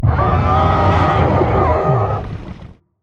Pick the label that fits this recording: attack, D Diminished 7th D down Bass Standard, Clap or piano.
attack